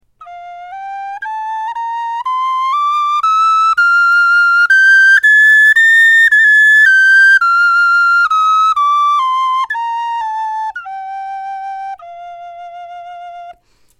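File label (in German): tonleiter, Knochenflöten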